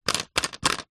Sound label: foley bottle plastic lid turn x3